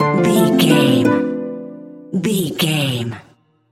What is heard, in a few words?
collectable item bonus